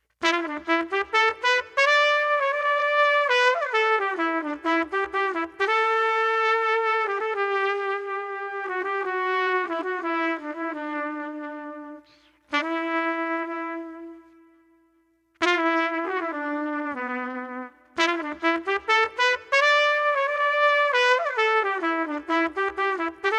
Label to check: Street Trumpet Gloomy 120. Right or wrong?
Right